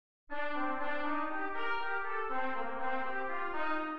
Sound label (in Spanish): Min7 en C